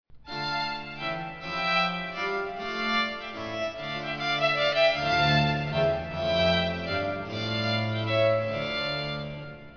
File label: menuet